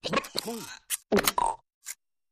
Circus Machine L / R Ch. Cartoony, Robotic.

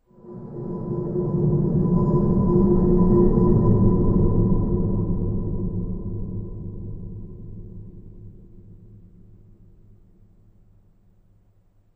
Pipe Drag Reverb

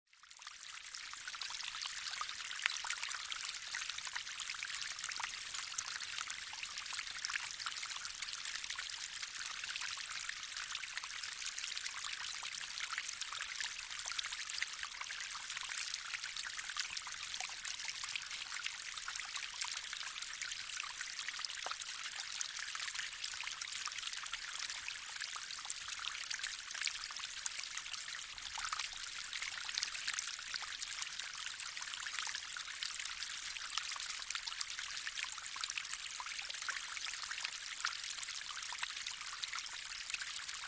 Water Trickling Down, streams

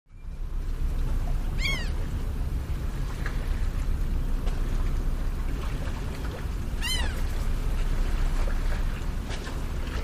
Harbor Atmosphere

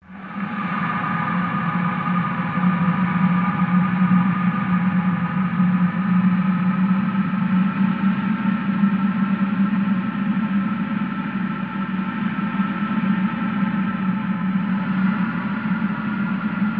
Subsexsonic Ambien, whooshes swishes

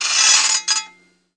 Metal Grind Wheel, grinders

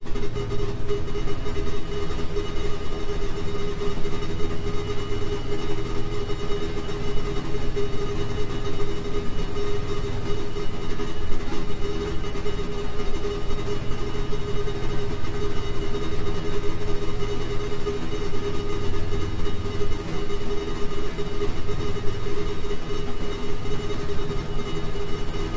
Scanner Digital High Pi, granular

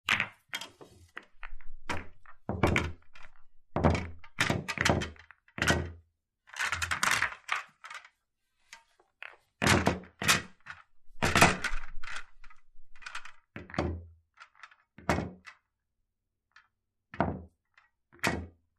Pool Game Billiards

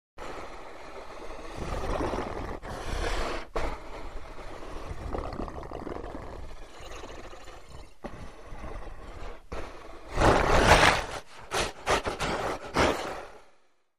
Growls generic Large, monsters

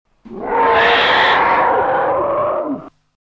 Alien Scream Angry; roar

